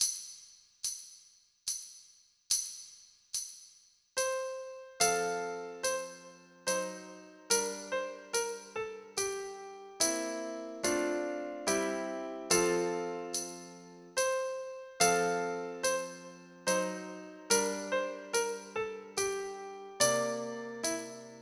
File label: Courtly Dance Slow 72